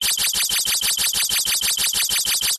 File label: science fiction alarm computer beeps harsh